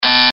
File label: buzz